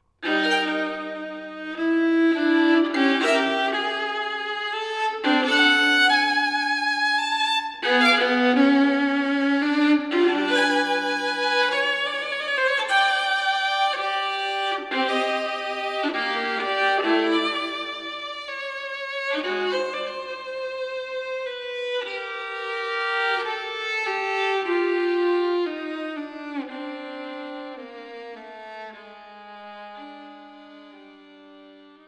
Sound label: Violin Sonata in G Minor Grave